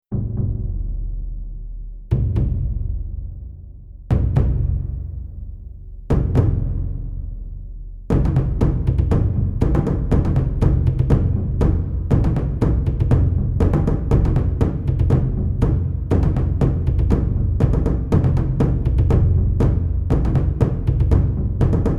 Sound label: fire dance